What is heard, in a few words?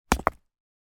foley rock small down on others